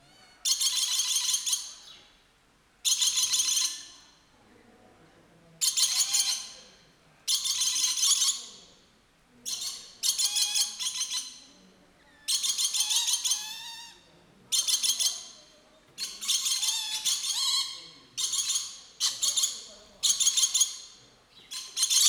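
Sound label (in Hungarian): csipogás szivárvány nyekergés sárga dolmányos lóri; nyiregyhazi zoo 2019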